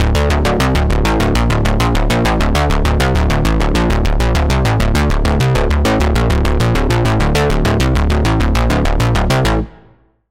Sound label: Bass, Sylenth1; Living Dead; Routed Sound; Sylenth1 Expansion; Doom Carpenter Vol.1